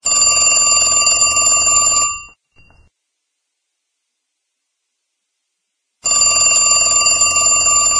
boring bell

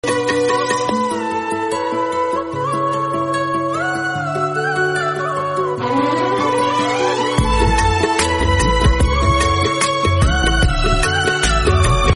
instrumental

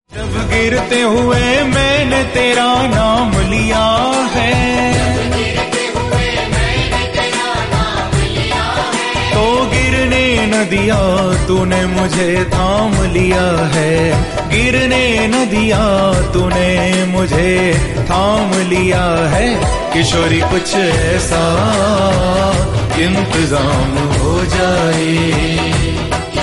hindi bhajan

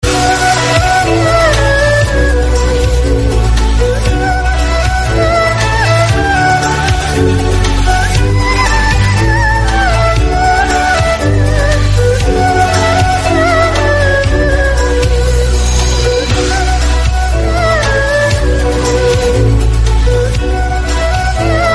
instrumental sedih violin

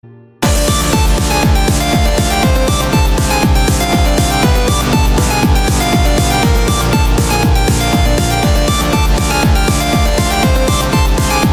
remix

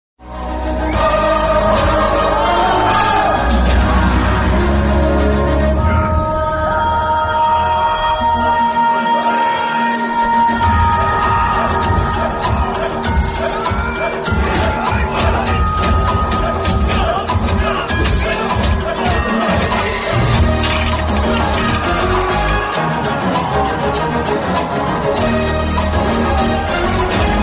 background music; vakeel saab

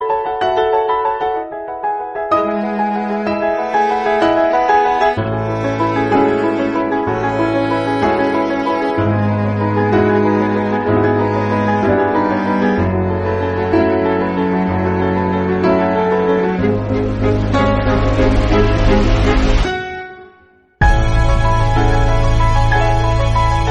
instrumental bgm

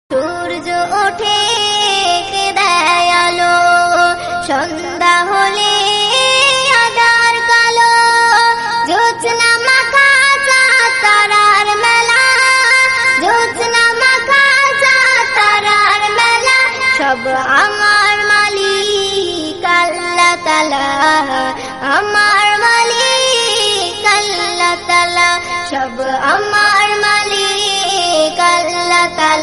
naat devotional islamic

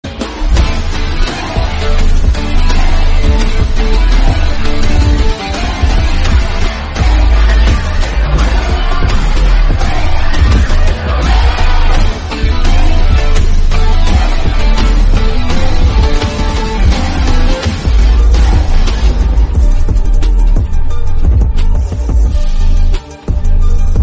action theme bgm

